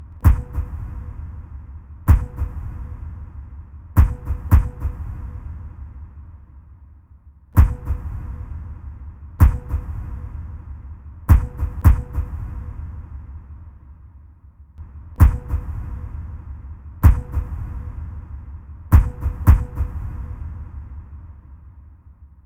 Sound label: Ditty